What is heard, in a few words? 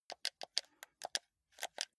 console controller play sequence lively